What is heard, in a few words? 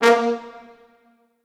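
Orchestral Brass, A sharp 2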